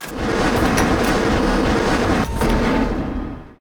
extend, volcano mining

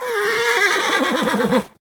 taunt, horse; combat; creatures; CosmicRage

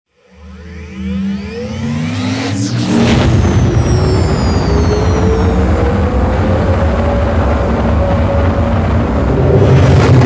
other launch, ships

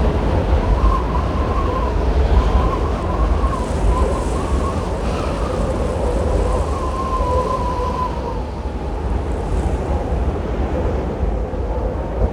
asteroid surface, ambiances; CosmicRage

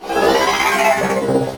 die, enemy droid; general combat

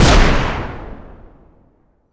rocket boom, weapons

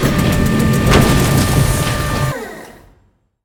process

